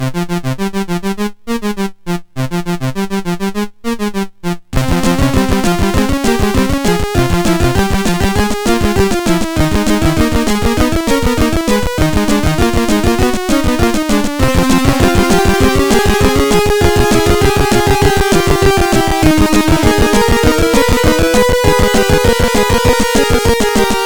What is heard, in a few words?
sid, MOS6581R4; Dreamtime